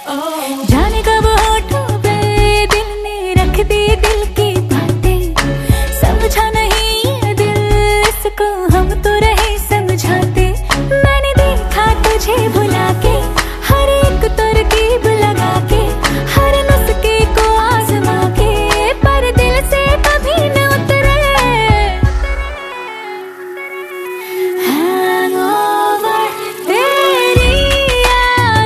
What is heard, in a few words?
Female Version